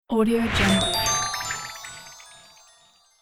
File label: spell of magic potion